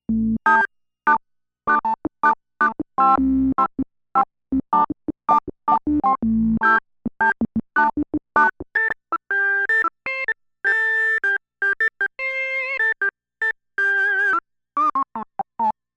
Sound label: Rotary Organ